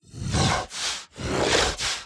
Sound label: Enraged Breathing Loop; The Town; Burns